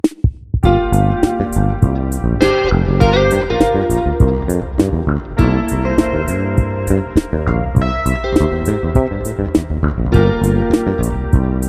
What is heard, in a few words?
fretless funk; weeklybeats 2024